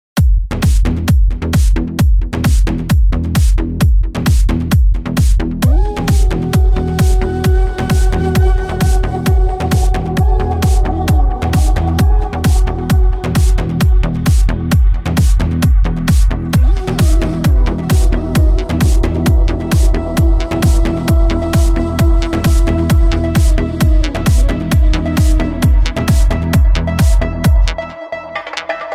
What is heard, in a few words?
Grooving Music